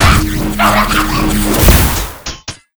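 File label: bolt death, monster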